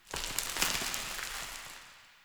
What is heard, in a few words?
Small Dust Rock Slides One Off; Nineteen Ninety Nine